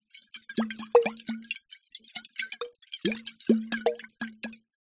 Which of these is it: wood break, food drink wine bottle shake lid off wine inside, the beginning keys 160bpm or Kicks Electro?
food drink wine bottle shake lid off wine inside